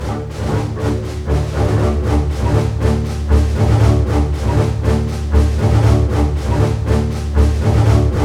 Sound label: 120 READY, Scoring Tools ORC Ready Fight; East West